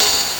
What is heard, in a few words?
LSDj DMX, Nintendo Gameboy; 300 Drum Machines